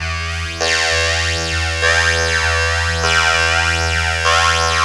Antimatter Perc, Processed Drumkits; Sonic Foundry; Sony Creative Software; Zero Gravity Beats

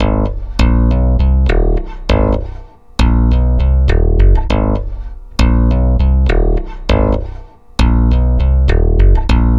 Stick Loop, Stick Bass

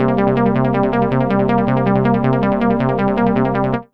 Seq 5 C 160, Sequences; Trance Formation

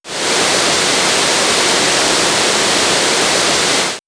Nature Autumn; X-Static Goldmine

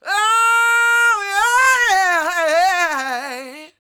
SOUL, Vocal Planet, Blues & Soul; CW SOUL

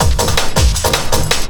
Drum & Bass, 160 BPM

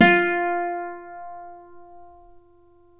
Celtic Harp, Plucked Strings; E-MU Producer Series Vol. 7 – Old World Instruments

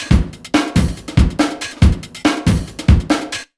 RUN 140, Big Beat Drumloops; AKAI

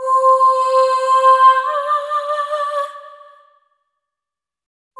Single Voice Melodic Loops, Vocal Samples; Pocket Diva World Class Vocal Samples

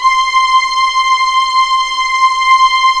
C6 EXP MF, VIOLINS; East West; The Ultimate String Collection

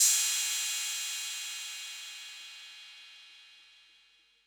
808 Cymbal; Concrete Collage Drum Kit; Concrete-808

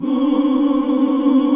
Gosp. Oooh; Gosp. Oooh 2

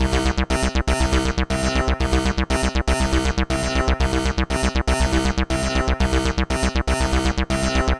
120 C synth, Synth Loops, Trance; Trance Explosion Vol1

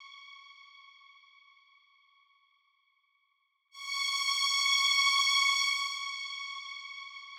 Strng Loop 130; Equipped Music; Loungin House

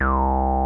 TB303; Zero G; Man Machine

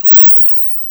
Serge High, Serge Modular drums

